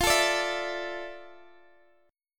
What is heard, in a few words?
A Suspended 4th F, chord; strummed